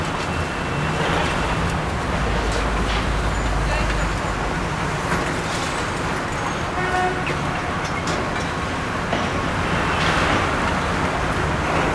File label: ambi town; Mafie 1